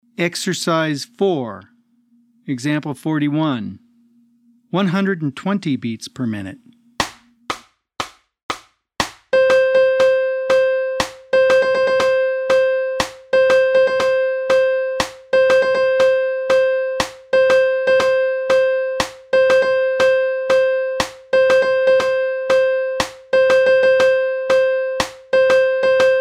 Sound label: 120 BPM